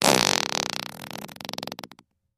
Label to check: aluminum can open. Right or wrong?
Wrong